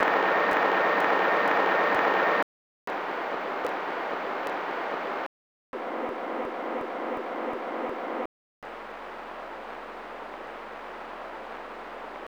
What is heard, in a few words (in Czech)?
Porovnani šumu 128,60MHz